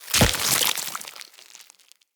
Root Spider Web Shot Proj Burst; Animals; Spiders; Abilities

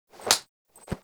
Face Slap; Lab; Lyon; Warframe; Tau; Protoframe Room; Ambience

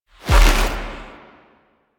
Drifter Pull; Warframe; NineteenNinetyNine; Stage12PartyOfALifetime

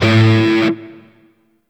Shawzin Oct One A; Warframe; Grineer Shawzin

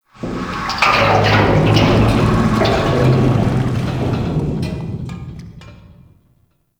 Metal Pipe One Off; Ambience; Props; Warframe; Entrati

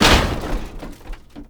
Military Checkpoint Wall Destroy; Warframe; Ambience; Nineteen Ninety Nine

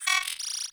Cipher Threat Short, UI

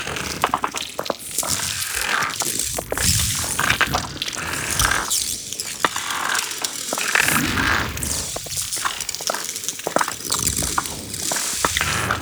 void ooze small loop; Ambience; Props; Warframe; TheNewWar; Zariman

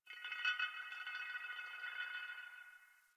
Exterior Man Hole Drag; Warframe; Ambience; NineteenNinetyNine

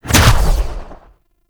Fire; Grimoire

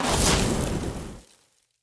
Short Sword Fire Whoosh; Weapons; Elemental

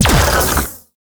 Flame Laser Fire; Warframe; Corpus; Corpus Railjack; Spec Ops Fire Moa

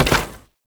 Step Metal Perforated; Warframe; Oraxia; Foley

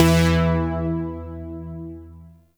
Shawzin Oct One E; Music; Warframe; Zariman

